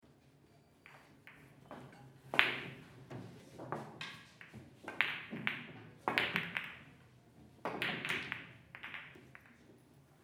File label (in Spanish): BILLAR AMBIENTE INTERIOR